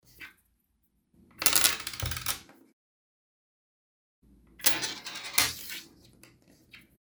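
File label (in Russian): вращение монет останавливают рукой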